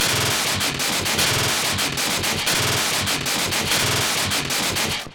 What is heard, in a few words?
djent meme, glitch